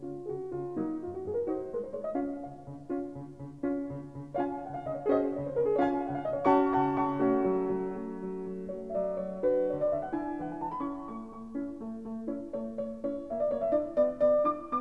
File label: classical